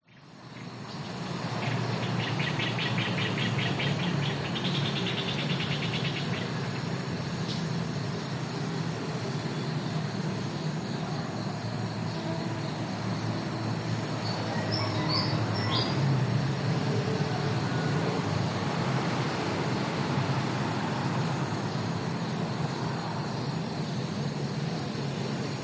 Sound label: town morning dist traffic birds roosters ben tre mekong delta vietnam